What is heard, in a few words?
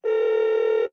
ringtone